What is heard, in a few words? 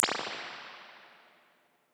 Fisher K10 Stereo